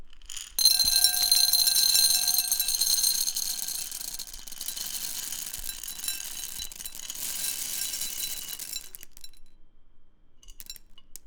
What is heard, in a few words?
Rycote Foley test